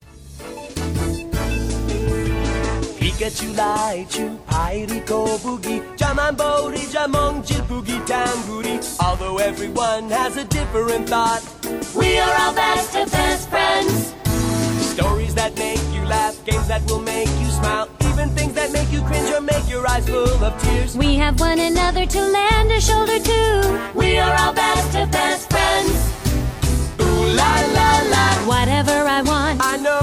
영어남, 오프닝송

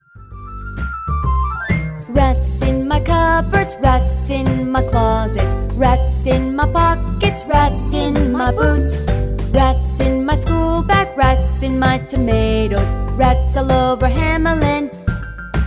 영어여 챈트

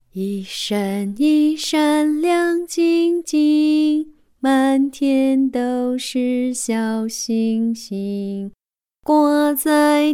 중국여 노래

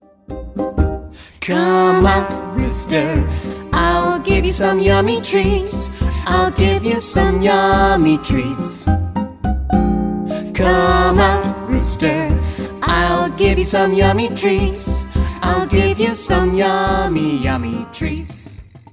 영어여 동요

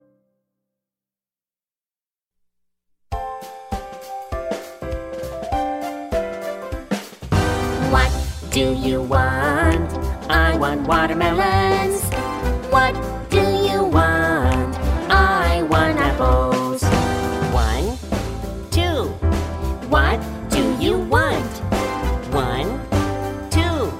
영국여 동요샘플

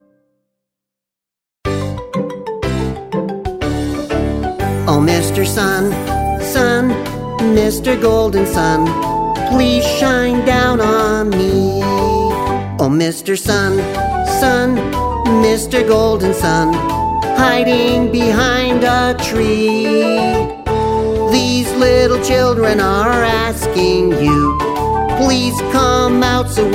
영어남 동요 남아; 남자; Mister Sun

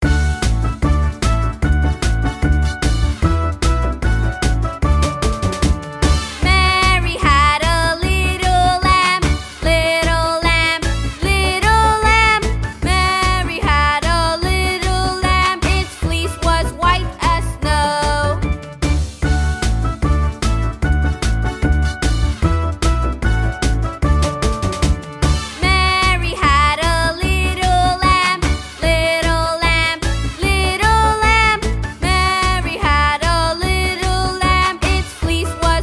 영어 여 동요 eng 비행기

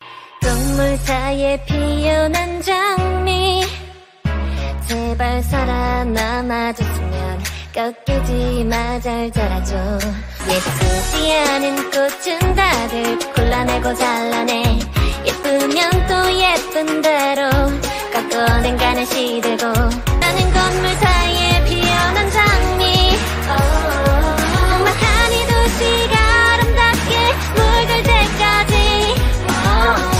가요커버

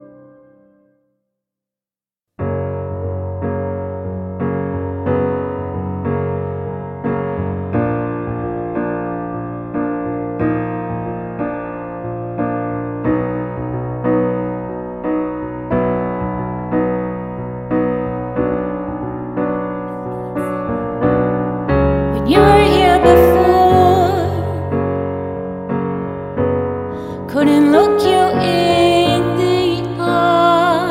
영어 여 노래

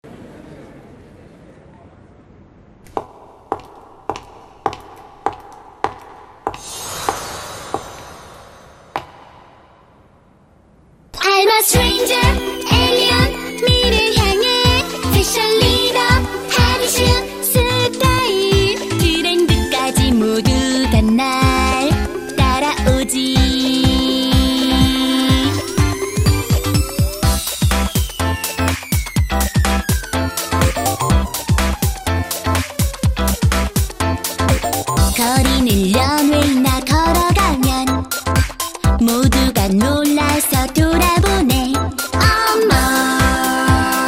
노래

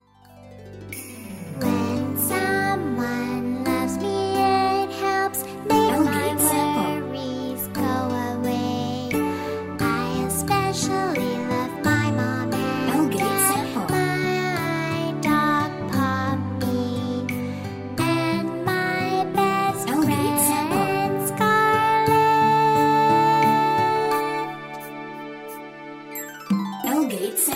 영어여 Girl

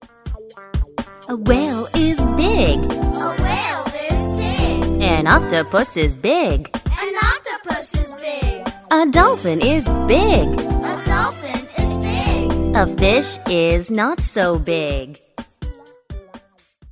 영어 여 챈트

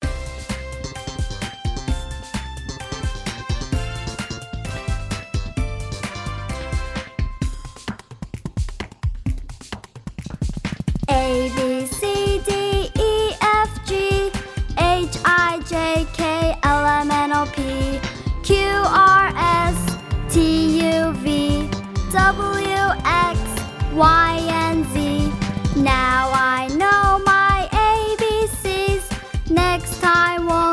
영어여 ABC Song

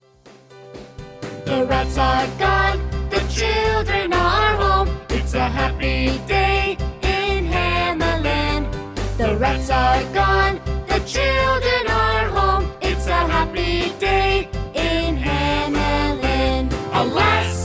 영어여 동요

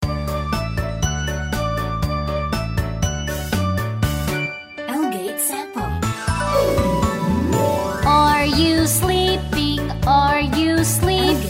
영어 여 노래

